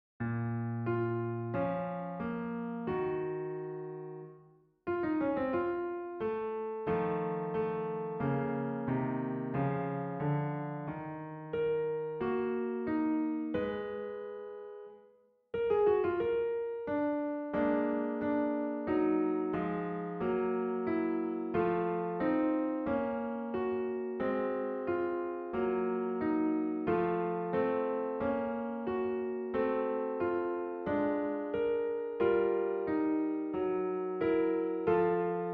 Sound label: Sonata Clarinet Piano in Bb Molto adagio Allegro